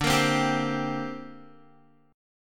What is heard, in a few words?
D 7th Suspended 4th Sharp 5th down Guitar; D7sus4#5; strummed